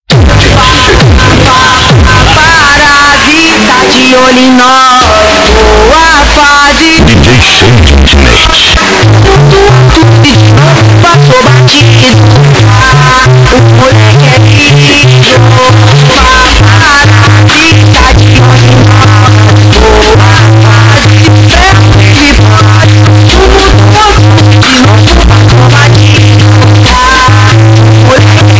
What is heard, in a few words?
funk grave bass